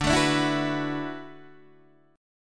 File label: D 6th Add 9th, Guitar, DADGAD